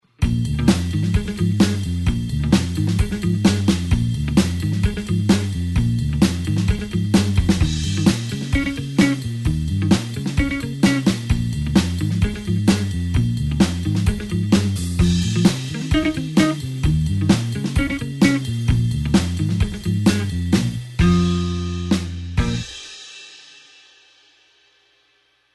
blues 8beat backing note

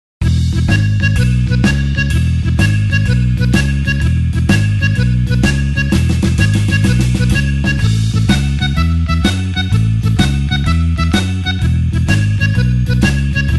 blues harp shuffle E

